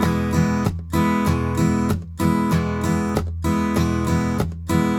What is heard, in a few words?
riff 96 E, Blues Guitar; ILIO; Fretworks